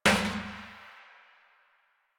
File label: Metal Trash Can Impact; Warframe; Ambience; Nineteen Ninety Nine